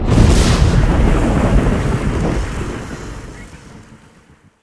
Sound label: spell magician magic storm